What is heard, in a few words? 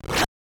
Punch Missed; Mike Tyson's Punch-Out